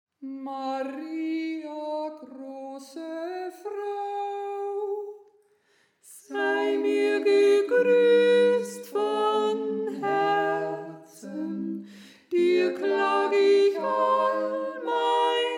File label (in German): Sopran; Maria Große Frau